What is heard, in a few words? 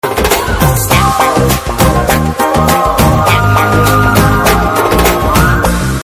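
Trailer background music Ringtone; Solo Brathuke So Better; Telugu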